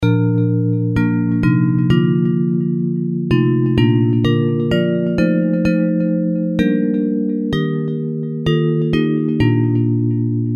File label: Bells